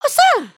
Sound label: curtsey, emotes